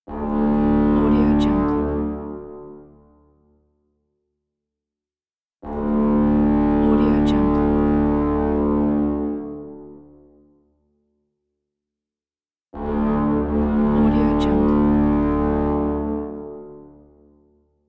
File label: ship horn; sounddivision